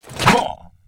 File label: STONEKNIGHT WAR ATTACK